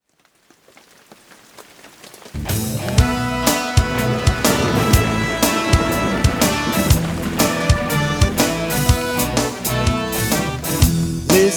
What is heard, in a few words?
Blues